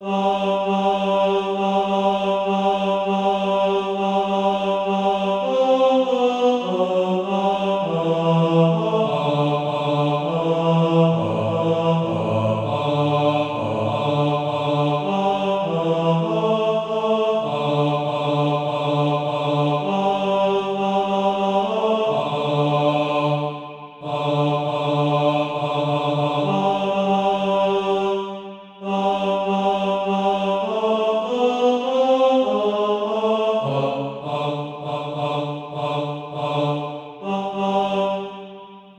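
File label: bass, Practice Music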